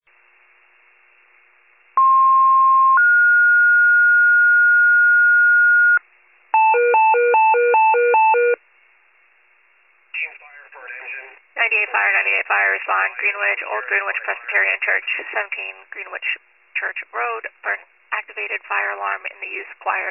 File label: fire, pager alert